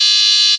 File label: bandpass alarm, electro FX